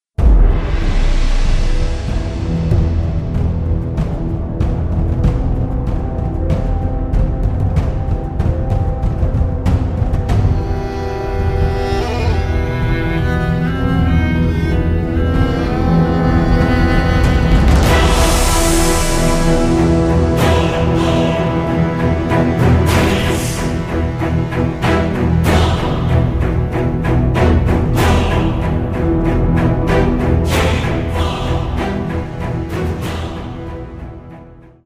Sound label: preloader music